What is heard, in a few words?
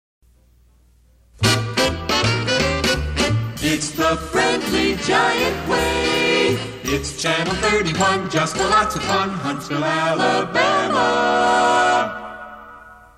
jingle; friendly giant